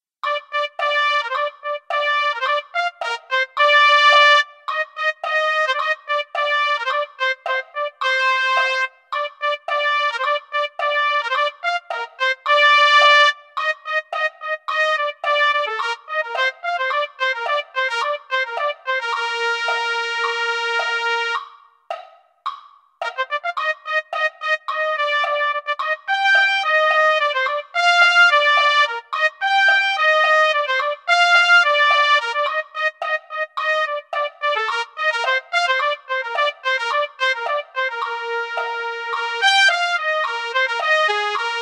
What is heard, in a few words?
trumpet; holiday brass